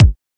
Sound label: kick